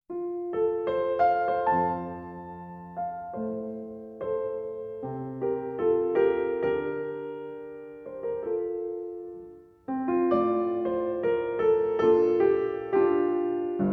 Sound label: Piano Sonata No 8 in A Minor Andante cantabile